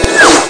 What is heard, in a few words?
swish, cartoon